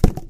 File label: ceiling tile impact hard, physics plaster